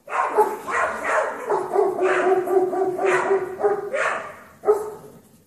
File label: Riding Dogs; SyrinScape SoundsScape Collection; bbh Haunting part 1; TTRPG